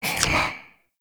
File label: Rifle Alt Mech; Weapons; Warframe; Zariman